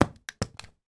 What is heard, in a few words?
household video game controller drop on carpet floor; nintendo wii